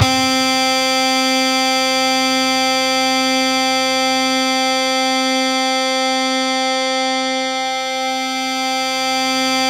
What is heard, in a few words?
C4, Synth Line; 90 s Sample CDs; NiceBeats; SynthLine VOL-33